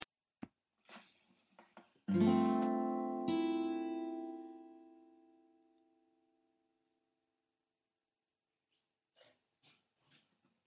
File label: fmaj7 g